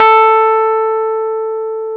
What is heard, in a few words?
Electric Pianos; Wizoo Electric Pianos